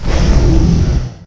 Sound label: attack, dragon; combat; creatures